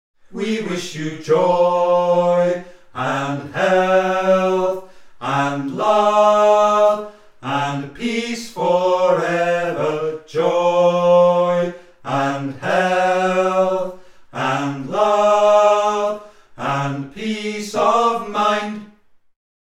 May your cup Bass

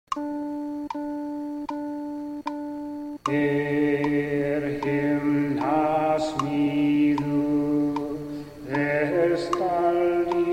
Upper middle Altos

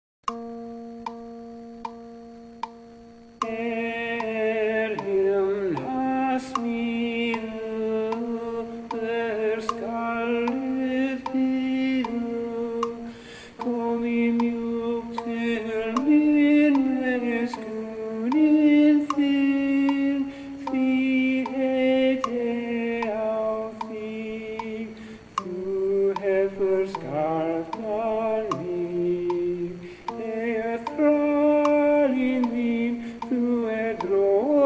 Lower middle Tenor

